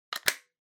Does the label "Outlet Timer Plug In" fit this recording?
Yes